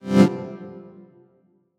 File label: Activation; WinterTales; GameObjects; Cubes